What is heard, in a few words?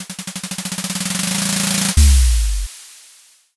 drumroll